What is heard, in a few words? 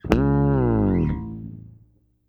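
SHORT FALL D2, BASS, EFX FALLS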